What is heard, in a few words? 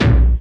bombo, kicks, Hardcore